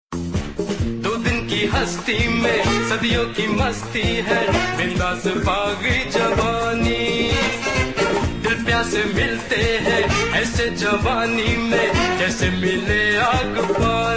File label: Disco